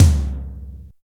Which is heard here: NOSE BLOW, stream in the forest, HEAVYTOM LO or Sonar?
HEAVYTOM LO